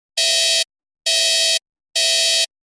error

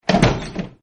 door

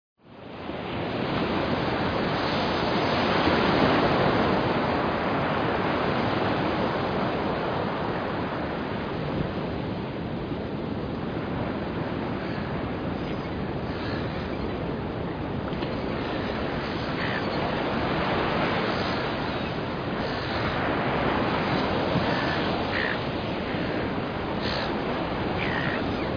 Seagulls, lake

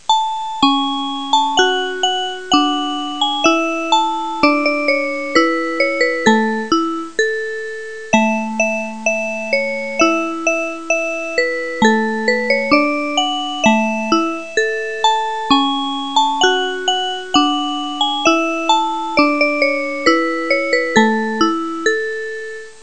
MUSICBOX

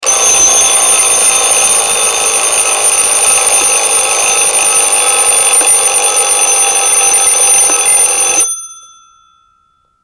clock

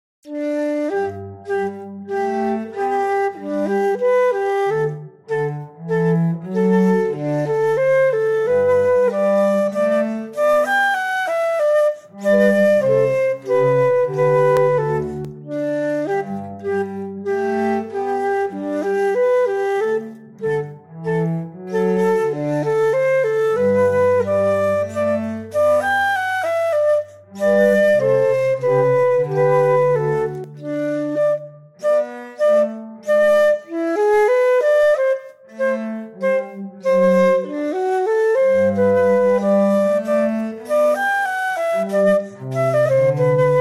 instrumental

